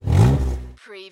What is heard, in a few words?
Car Motor Engine Rev Engine Perspective